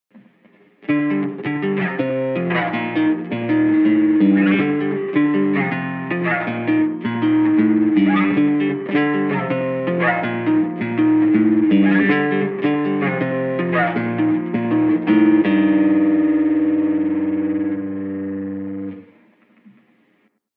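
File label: Gm 160 guitar riff trash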